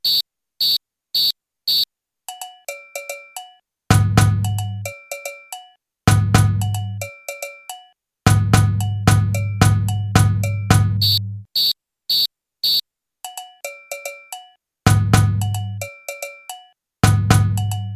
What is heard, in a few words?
Ijexa call response, samba